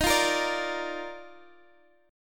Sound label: D sharp Major 7th Suspended 2nd, Guitar, Half Step Down